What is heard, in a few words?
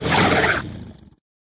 alien scream; serqet; smite